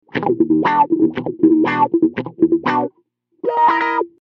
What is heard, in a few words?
Wah-Wah Clean Dark Funk